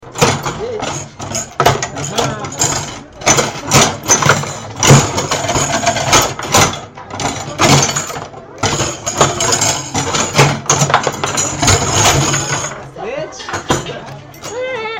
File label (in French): baby foot